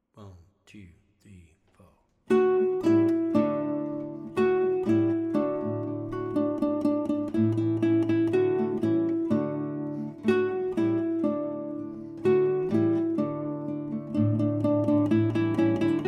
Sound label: in D, guitar; Hot Cross Buns